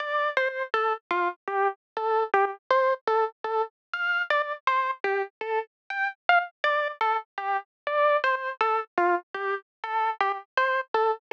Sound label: Melody F 122 Dry